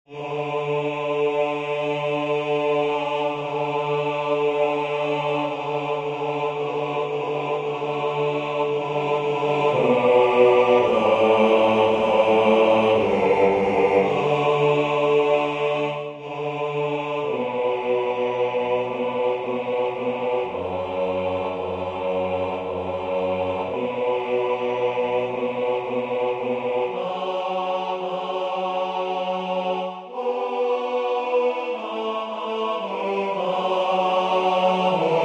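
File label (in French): basse, Musique sacrée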